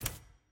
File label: sfx ui toggle click